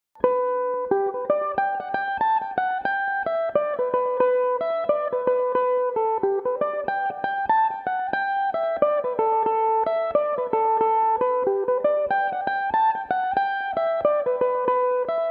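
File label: G major, Irish mandolin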